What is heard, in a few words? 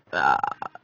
uhahhha, swine